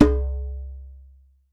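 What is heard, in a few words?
LARGE CERAMIC, DUNBEK; Voice Crystal - Steve Reid Definitive Percussion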